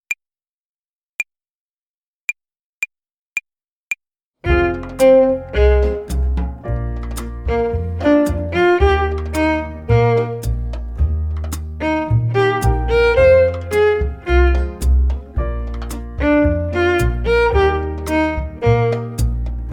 Akkordzerlegungen Übung 6, Play-along Slow, Jazz Club Violine

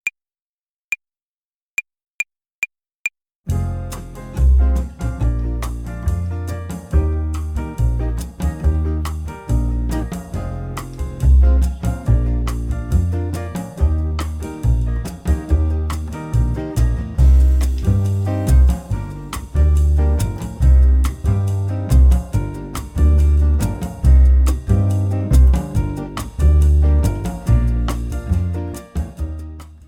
Akkordzerlegungen Übung 1 bis 6, Play-along Fast, Gitarre; Jazz Club

